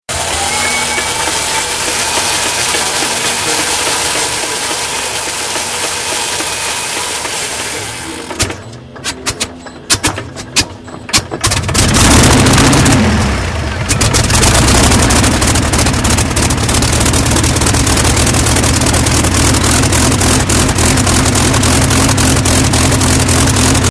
AIROPLANE SPITFIRE ENGINE TURN OVER STARTUP, Restored Spitfire engine; Vehicles; Airplane; Airplanes